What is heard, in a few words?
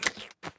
press cutter; Dorothy And The Wizard Of Oz - Cookie Magic